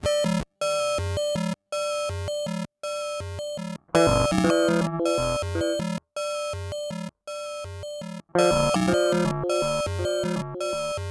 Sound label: Sample Sequencer; Ottobit